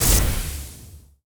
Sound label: magic cast generic; MagicArsenal